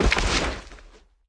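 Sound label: movement bodyfall; Smite; Cabrakan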